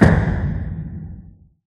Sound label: death; Minecraft; 4jcraft